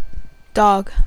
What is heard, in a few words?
dog